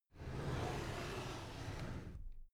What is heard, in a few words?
drawer cabinet carton close soft